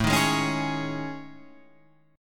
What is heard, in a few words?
A Major 7th Flat 5th, down Guitar Drop D